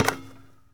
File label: Street Ball Wood Back; SFX Kit; Street Basketball